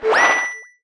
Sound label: Basketball